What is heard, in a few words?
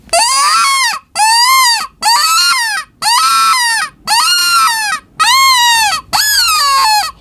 Wicked Vixen, fox caller; T2 Black; 3D printed fox caller